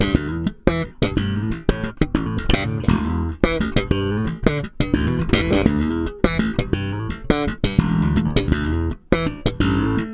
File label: Funky Slap Groove